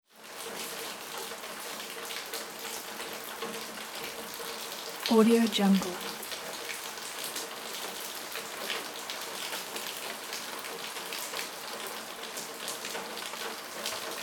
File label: light rain with gutters dripping